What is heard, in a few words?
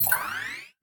purchase start; tetrio